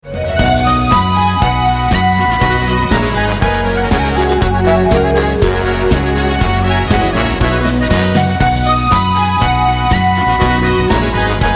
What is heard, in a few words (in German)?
Trompetten